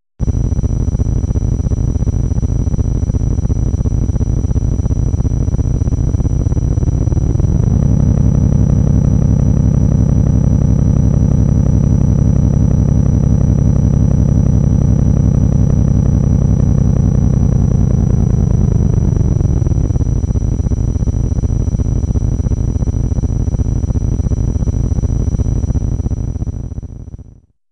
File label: Low Frequency Modulation